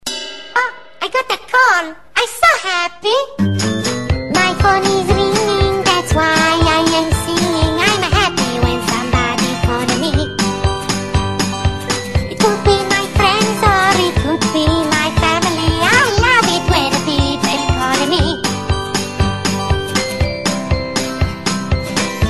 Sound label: chinese happy cutie, sms